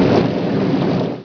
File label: morse Grounder Die Snd; F.A.R.M. Patrol; MacEasy Magazine CD - Issue 18